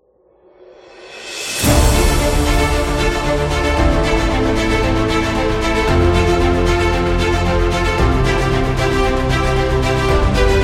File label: Beat Epic